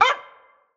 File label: Bork